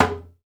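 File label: conga low, Latin; Roland L-CD701; PRC Latin 1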